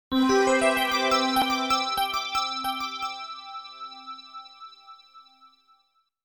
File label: Power Star; N64; SUPERMARIO64